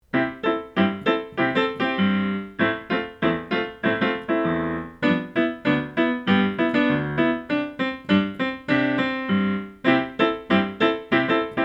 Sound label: pno